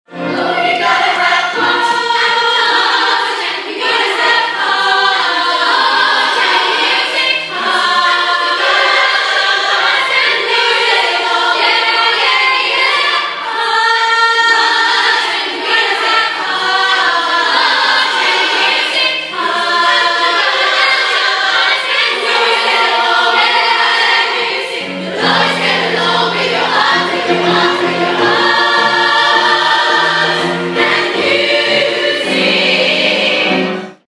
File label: rehearsal